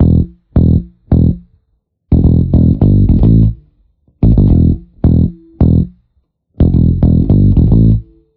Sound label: bass; Phonky